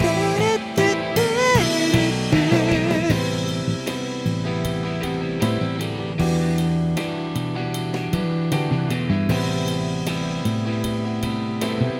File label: no c bass